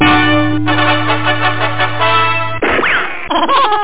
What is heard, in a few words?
FANFARE; superwort; Amiga 8-bit Sampled Voice; 8,363 sample rate